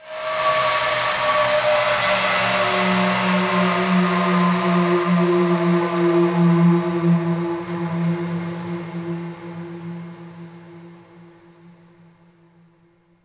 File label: ice; DAoC; Toa; Gamestar